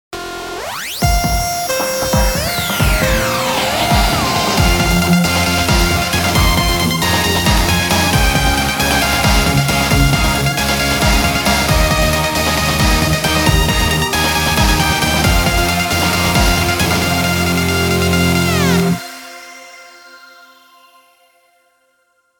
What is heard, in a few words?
Turbine Loop Intro; Team Sonic Racing